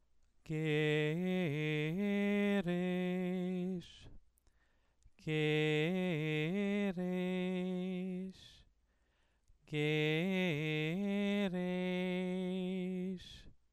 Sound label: Megilat Esther exercise